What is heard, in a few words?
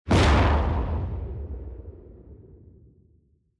Cannon